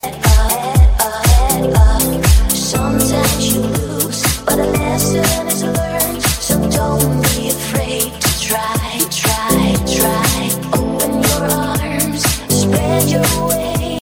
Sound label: samsung trance ringtone